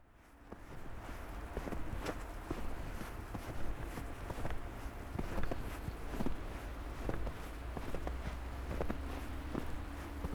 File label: rhythmic walk